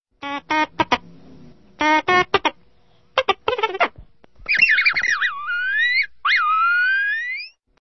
Mouse Droid

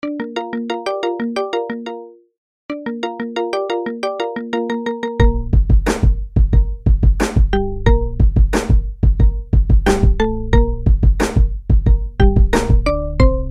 Marimba

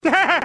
chuckle; Peter Griffin

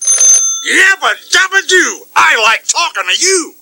Phone; Flintstone

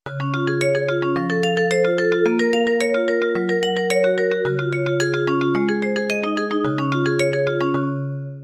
Dream Bells

